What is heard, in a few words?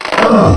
fall; Alien Arena; nemesis